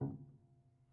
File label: Prepared Piano